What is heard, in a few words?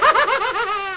surf